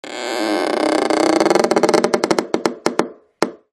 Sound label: wood creaking